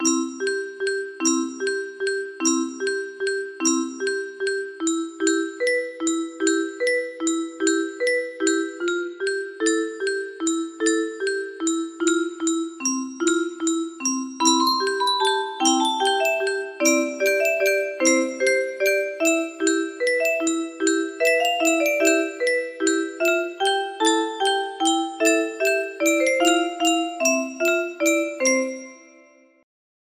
lullaby, music box

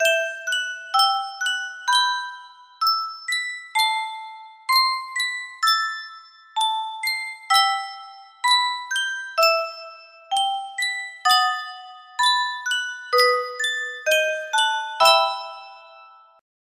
yunsheng custom tune music box, music box melody

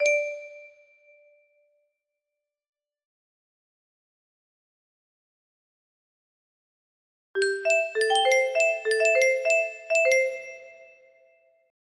melodies, music box

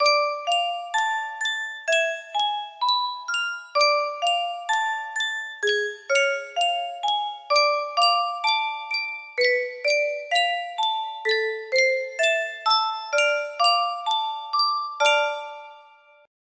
Yunsheng music box lullaby, melody

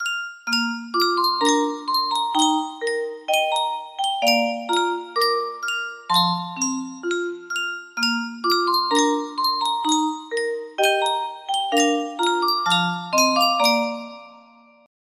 custom tune music box, music box melody

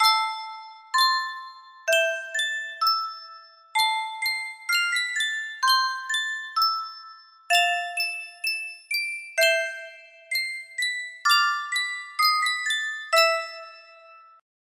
Sankyo Music Box, music box melody; final fantasy ix

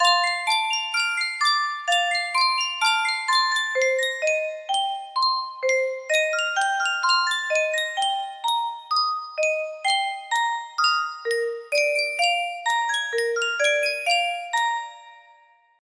music box

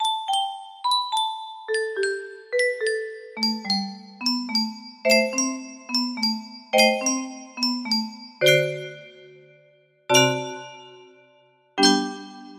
waltz, music box melody

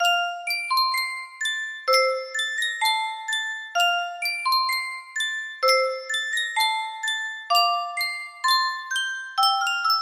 Music Box

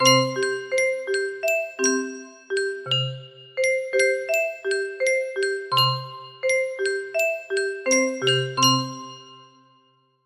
music box, music box melody

